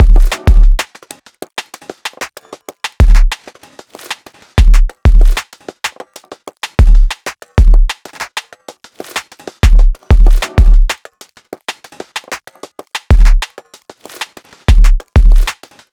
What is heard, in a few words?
Music DRUMS; CP Music Biome2 DRUMS Phase02